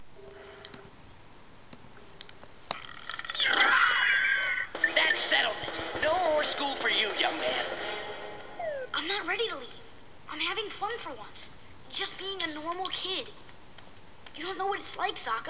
Haha

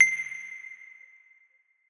Hi Saw Notification

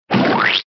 Splat

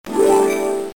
Harp

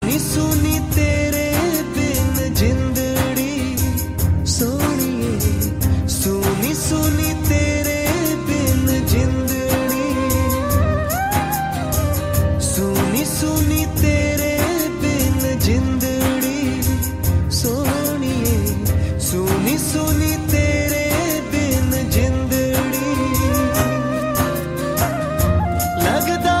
Punjabi

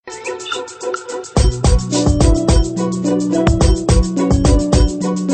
Classical Hip-hop

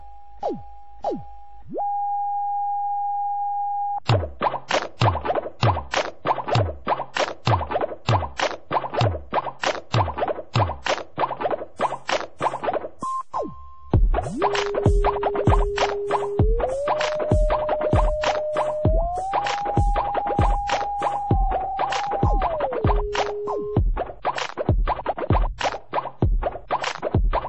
Instrument

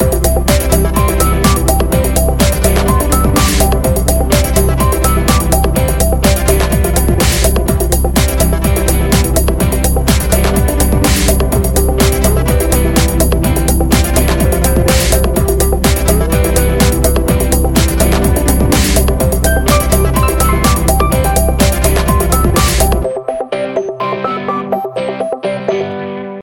Guitar Rock

